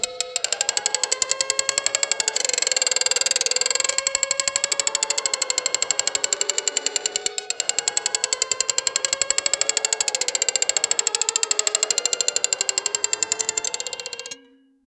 metallic clicking sound; building

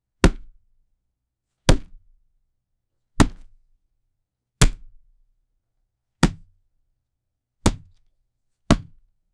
impact of a heavy object; violence

